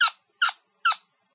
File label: Quail Man Panic